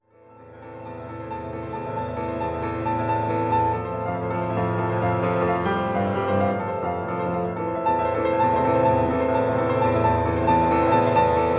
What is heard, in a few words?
solo piano